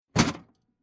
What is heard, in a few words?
Tool Box Dropped On Ground Impact Metal Rattle; Hand Tools